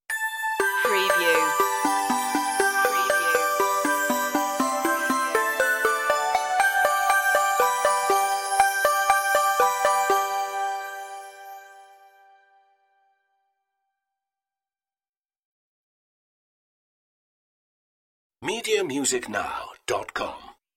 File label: merry christmas synthified stinger outro